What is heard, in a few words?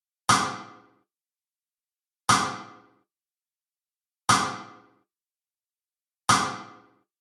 Bad Stereo AT4040 Sidestick